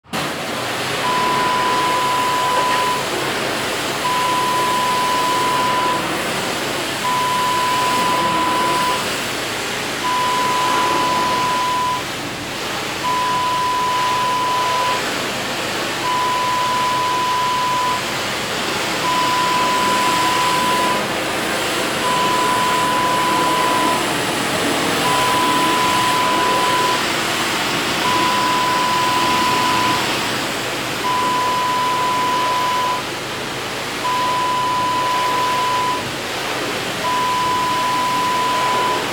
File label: pressure washer